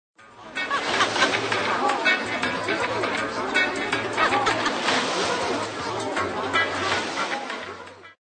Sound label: sound beach; Motorola V600